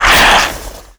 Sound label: attack, antlion; creatures; vox